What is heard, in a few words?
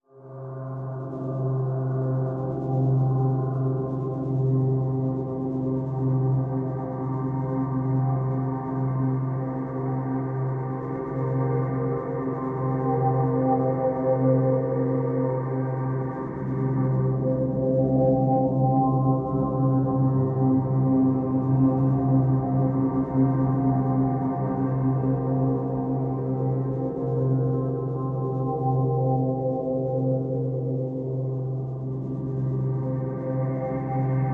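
drone; Centigrade C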